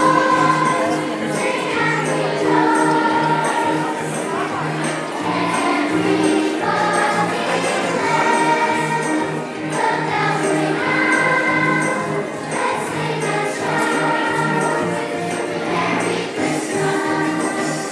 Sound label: school choir at school xmas fair